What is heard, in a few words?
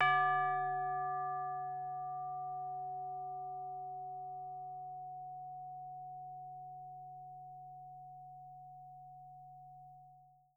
D5, Percussion & Harp; Miroslav Vitous